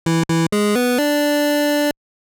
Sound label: 8 Bit